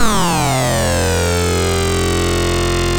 ARP Synths, RUMBLE REZ F0; E-MU Formula 4000 Series Vol. 3; Analog Odyssey

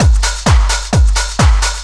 drum 130 groove; Techno Trance; DRUMLOOPS; Techno Trance Essentials

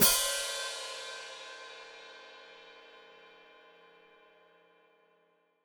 Hat Closed Open, Hi-Hat

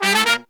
Horns, 120BPM; 90 s Sample CDs; Zero-G; Phantom Horns

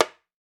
WALK DRUM, African Drums

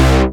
SEQUENCER, synths & keys; Techno Trance

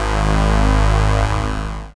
SYN DANCE; Sound & Vision - Gigapack II

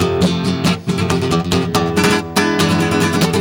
GUIT GROOVE; Best Service; World Colours

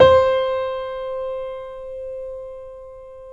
CONCERT PF C4; AKAI S-Series CD-ROM Sound Library VOL-7

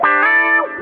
GUITAR

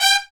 BRS TP, Roland, Stabs; Roland L-CDX-03

